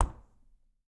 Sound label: Refrigerator Door Open, Household